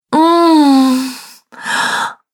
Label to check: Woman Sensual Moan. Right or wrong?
Right